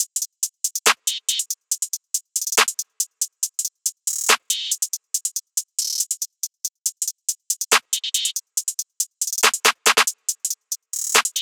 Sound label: Drums 140bpm Top Loop Trap